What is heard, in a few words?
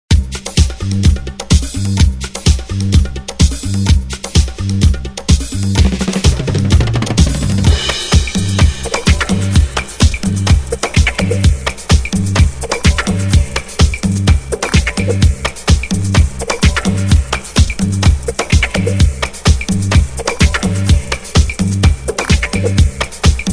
macho disco sound